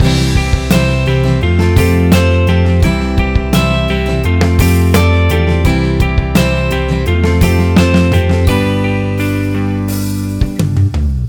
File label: function cadence half